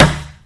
Rubber Wood, Collision Sounds; opensim; CollisionSoundsAssetSet

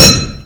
Metal Stone, collision sounds; opensim; CollisionSoundsAssetSet

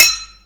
Glass Metal, Collision Sounds; opensim; CollisionSoundsAssetSet

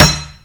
Rubber Metal, collision sounds; opensim; CollisionSoundsAssetSet

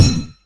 Terrain Metal, collision sounds; opensim; CollisionSoundsAssetSet

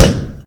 Stone Flesh, Collision Sounds; opensim; CollisionSoundsAssetSet

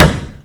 Rubber Stone, collision sounds; opensim; CollisionSoundsAssetSet

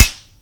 Flesh Glass, collision sounds; opensim; CollisionSoundsAssetSet

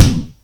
Terrain Flesh, Collision Sounds; opensim; CollisionSoundsAssetSet

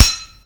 Metal Flesh, collision sounds; opensim; CollisionSoundsAssetSet

